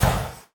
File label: Ion Thrust Combined Loop; Player